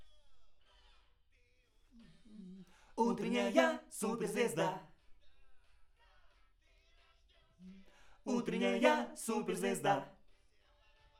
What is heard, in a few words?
AKG BOYS